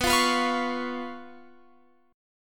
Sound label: B Suspended 2nd Flat 5th down Guitar Open F; Bsus2b5; strummed